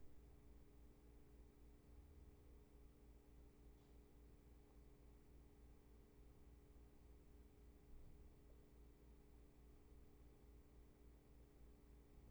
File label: room tone